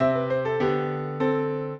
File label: trio, piano; Mozart